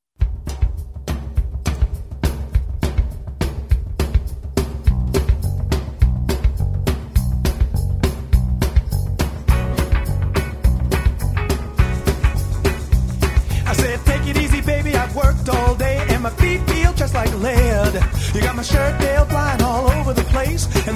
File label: Bossa Nova